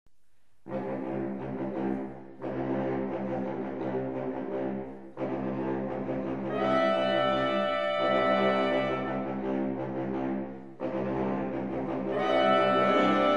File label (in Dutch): Concert voor sopraan sax en sax ensemble; Brabants